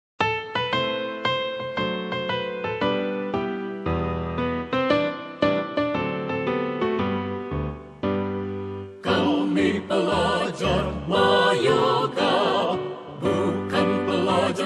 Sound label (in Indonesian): Mars pelajar versi piano; ULTRAPRIMA